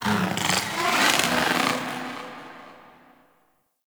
Sentient Lair Exterior Creature

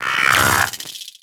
Amalgam Moa Vocal Idle

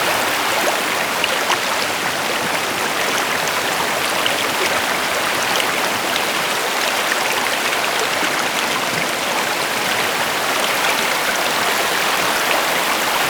Water River Loop; Ambience; Props; Warframe; Duviri

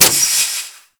Heat Gun Mech; Warframe; Grineer

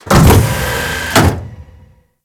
Mach Pump; Warframe; Grineer Galleon; Mobile Defence

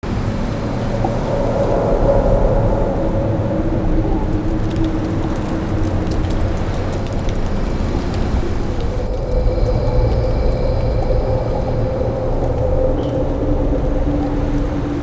Amb Night Loop; Ambience; Mendicant Area

